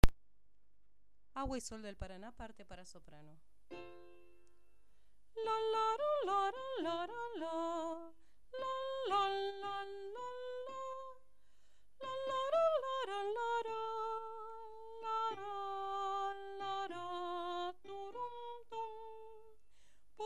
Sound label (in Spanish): Sopranos